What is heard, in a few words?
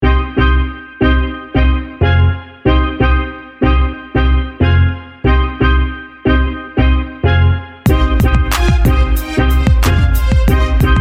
hip hop beat